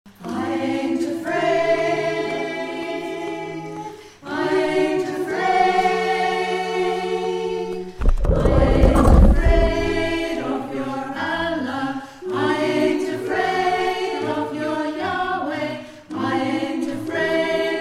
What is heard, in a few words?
Alto